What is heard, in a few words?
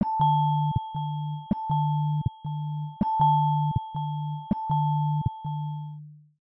Crystal Echo, ringtones Calm; Samsung Galaxy S22 SM-S901U